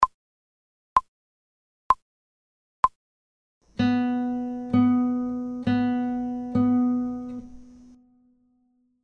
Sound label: slow, guitar; without metronome; B Where